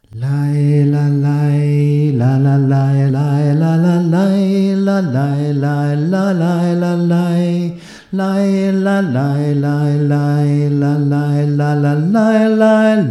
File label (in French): Lai la Homme voix